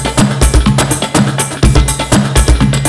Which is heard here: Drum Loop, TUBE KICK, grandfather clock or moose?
Drum Loop